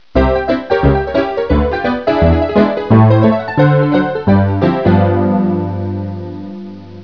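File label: honky tonk; fortress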